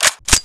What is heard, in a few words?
g3 clip in; garrysmod